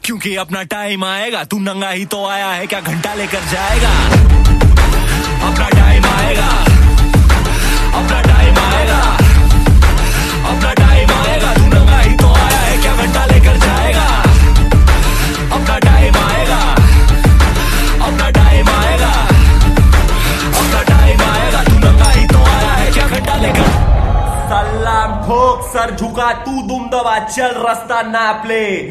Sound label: New Hindi Ringtone